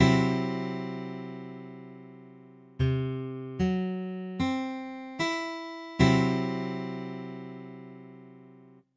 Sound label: CB, Guitar Chords; Cb5